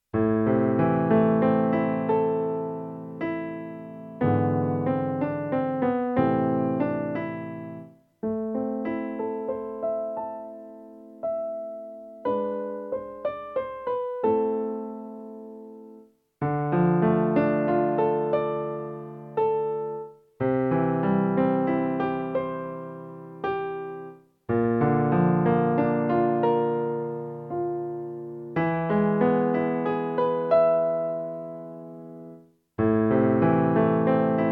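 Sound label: prelude in a minor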